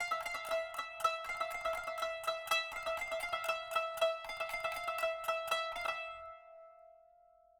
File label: kanun